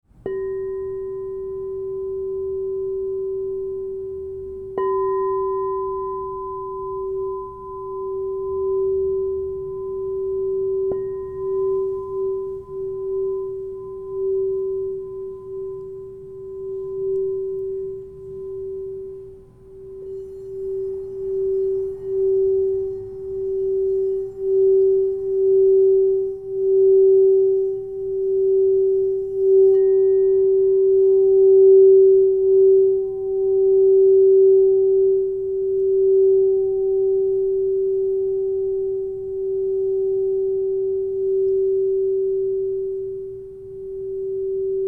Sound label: Crystal Tones Singing Bowls